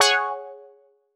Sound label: Quart Stab Ab; PowerFX - Nu Jazz House